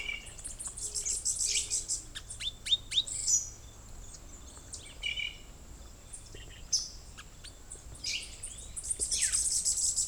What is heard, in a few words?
Zapata sparrow